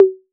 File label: 808, CONGA, Electronic; Wizoo - Platinum 24 Electronic; CONGA MENU